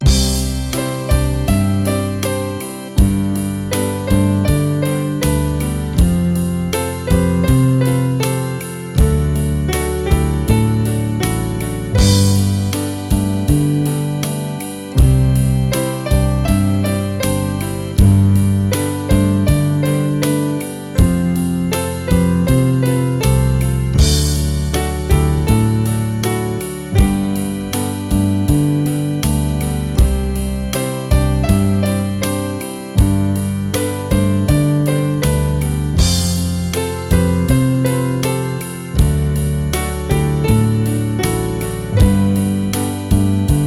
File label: Piano